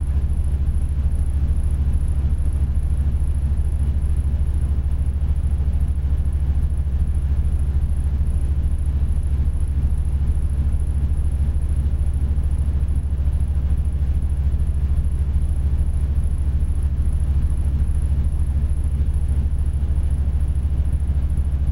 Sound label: Laundromat